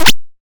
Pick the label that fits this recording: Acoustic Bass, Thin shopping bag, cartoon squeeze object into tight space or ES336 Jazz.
cartoon squeeze object into tight space